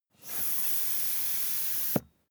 air pump ball hard

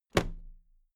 toolbox close drop lid soft

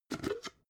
jar glass plastic open cap

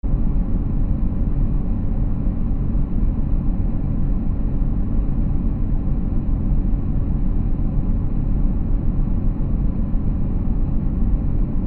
em transmission line

